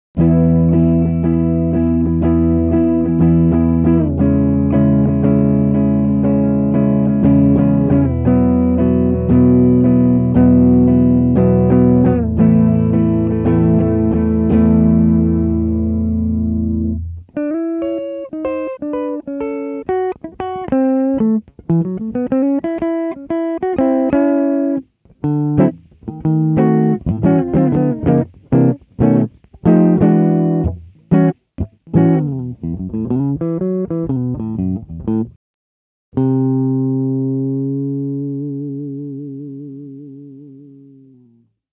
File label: Sapphire